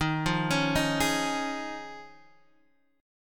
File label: Eb 7th Suspended 2nd Suspended 4th Eb, Guitar Drop D